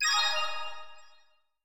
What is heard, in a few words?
Chimes; KDE; knotify